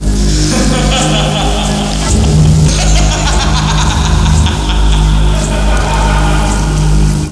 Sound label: laugh